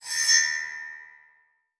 Multimedia Internet CD-Rom Flash Pop Up Electronic